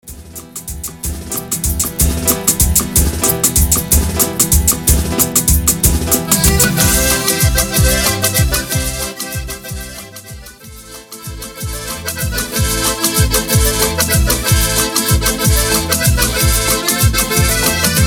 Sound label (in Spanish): Intro Simple 125 Bpm